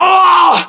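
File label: death, male; english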